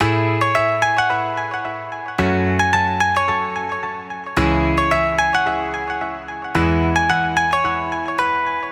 Guitar Loop - 110 BPM A Maj; Future Bass; Instruments; Moonlight; Guitar Loops

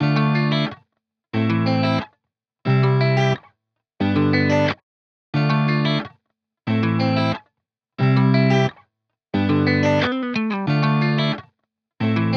90 BPM A Min Chords, guitar; Loop Stems; Cymatics; Brand New

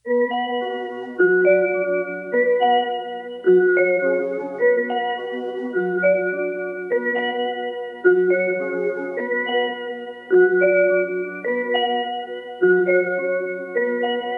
Dark Melody Loop 105 BPM F# Min; Cymatics; Oracle Sample Pack

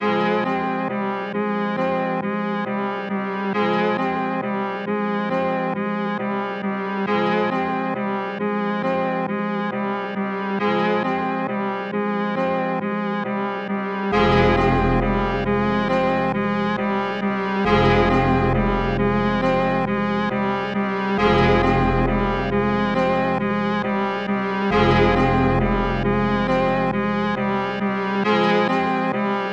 136 BPM F Min, Melody, Trap; Cymatics; 2022 Melody Collection; Trap Pt 2

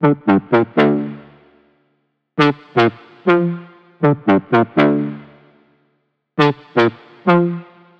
Loop Synth 120 D; Deep House; Wounda; Ghosthack; Advent Calendar 2019

